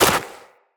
Walk Snow, Footsteps; Operator

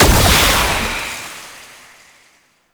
Incarnon Wrist Gun Alt Impact, Weapons; Warframe; Tenno

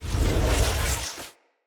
Casting Device Charge Heavy Start; Weapons; Player

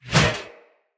Sphere Board Whoosh; Weapons; Swords; Sphere Sword And Board

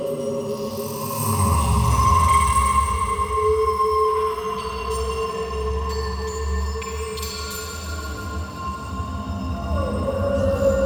Ambient Loop, Exterior; Warframe; Ambience; Cephalon Weave

